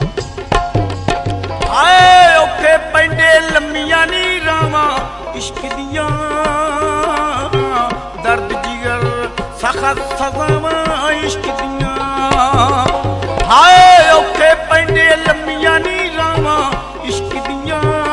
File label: allah hoo